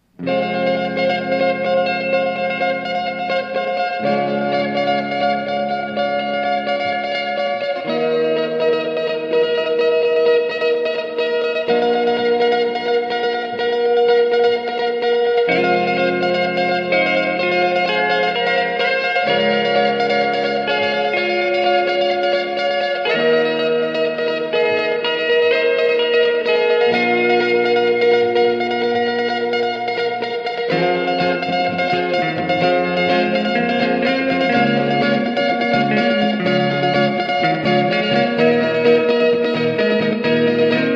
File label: Acoustic medley; Last Ninja2